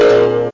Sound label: alert, alarms